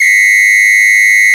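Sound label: Long whistle, Roland MC-303 Percussion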